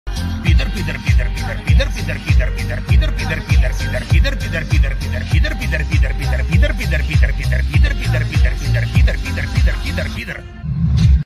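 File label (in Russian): мужик поёт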